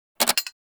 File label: Mech Release, Weapons; Tenno; AK Bolto Prime